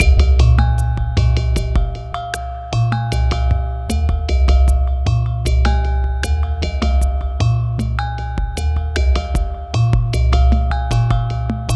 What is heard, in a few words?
Minimalism, Processed Percussion